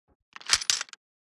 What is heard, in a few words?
lock picking